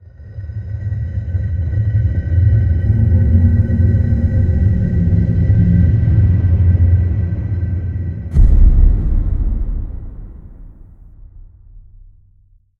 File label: elevator down